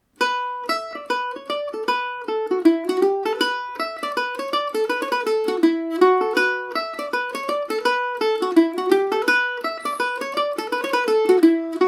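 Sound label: E dorian, Irish mandolin; john kellys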